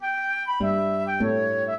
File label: minuet, flute harp; Mozart